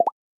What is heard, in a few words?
new message